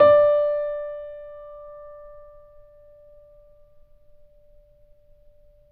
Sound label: Mp D4, Grand Piano; AKAI S6000 CD-ROM - Volume 2; Grand Piano 256M